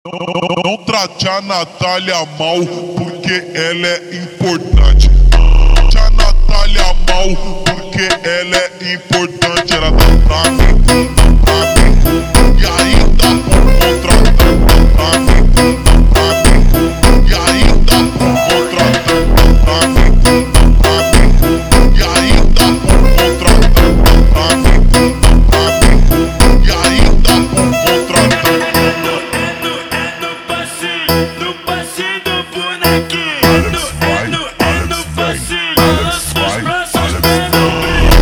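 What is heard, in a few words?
slowed, phonk music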